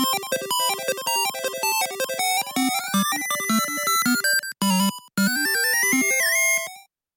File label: Neo Geo Pocket Color - Startup